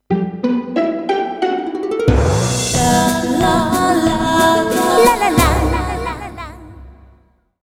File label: Tiny Bells; Disgaea: Hour of Darkness